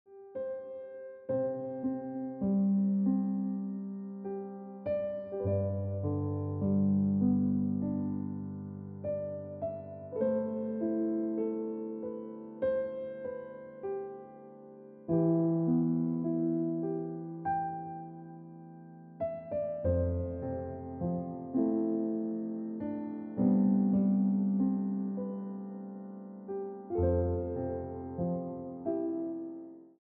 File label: Piano Music